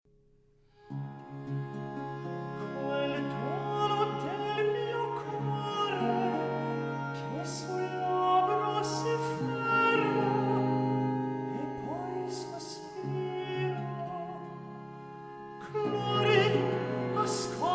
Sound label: Recitativo; FONS MUSICAE; Caldara